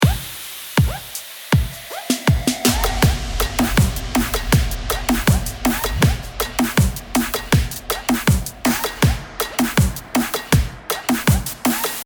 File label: Drum Fill